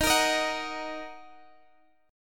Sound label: Eb Minor, Guitar Standard; Ebm strummed; x,x,13,15,16,14; JGuitar